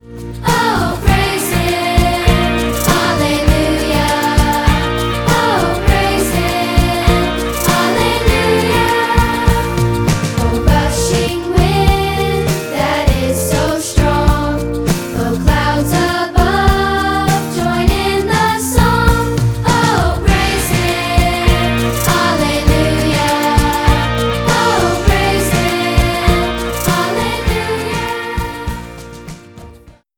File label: instruments